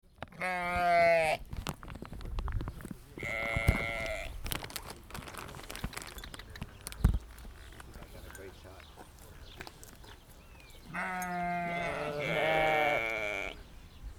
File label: Sheep Near Melide